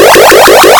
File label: banknote; contactsamcruise